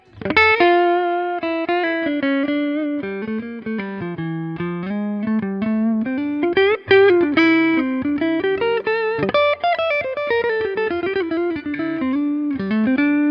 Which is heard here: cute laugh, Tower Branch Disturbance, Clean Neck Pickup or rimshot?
Clean Neck Pickup